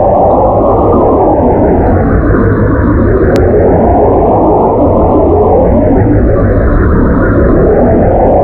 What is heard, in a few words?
Water Tube Spin Loop; Warframe; Orokin